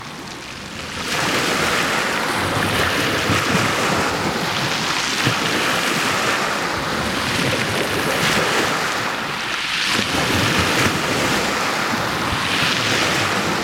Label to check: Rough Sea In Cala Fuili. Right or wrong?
Right